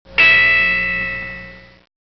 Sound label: ding